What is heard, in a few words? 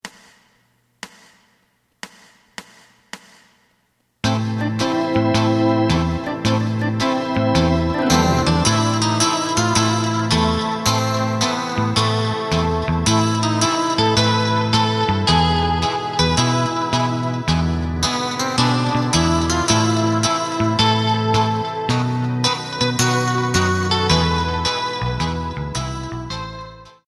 drum